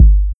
Kick Sub

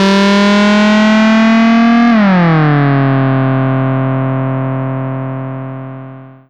Up N Down

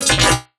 Perc Metallic; Granular Sumo

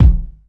Kick Jazz

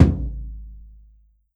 Tom Low Trash

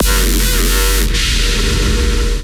Sound FX FM Tone

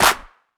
clap; foxdot default

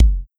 Kick

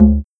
Tom Low Bass; Kroshe

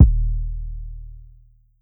Kick Push It

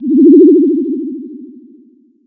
Sound FX Flyby Hi Tech

